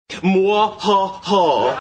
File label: muahahahaaa